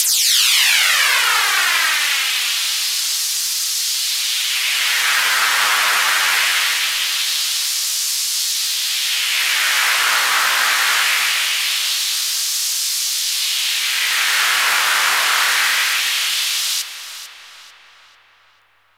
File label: Wind, Roland JX-305